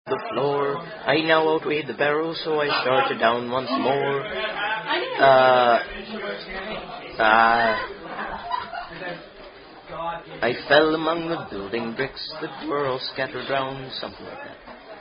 Drunken Songs; Why I Am Late For Work

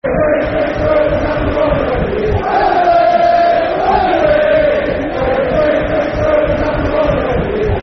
Wembley, Football Chant; Bristol Rovers Brfc

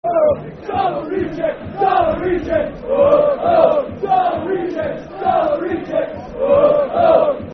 Darlo Reject, Football Chant (Soccer Songs); Darlington Dfc

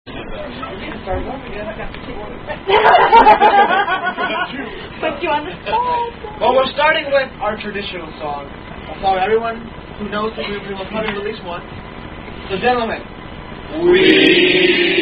Fraternity Man, Sung Sigman Nu Song

